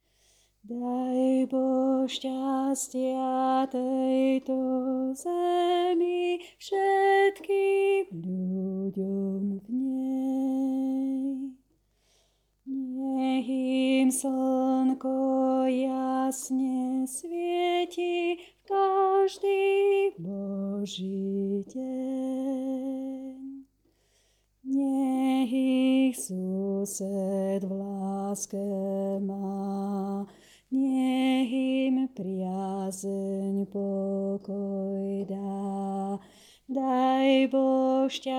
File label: Bass; Daj Boh stastia